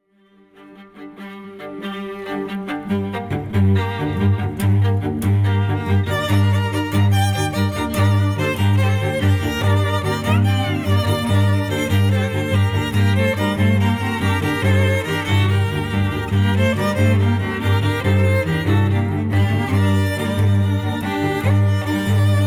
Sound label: String Ensemble